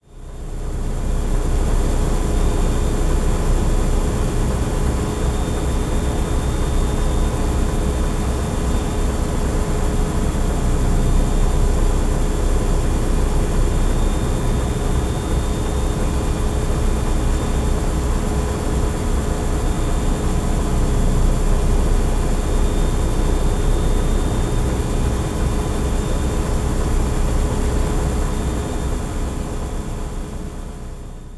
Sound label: background industrial ambience; Industrial Ambiences and SFX; BlueZone; Dark Mesa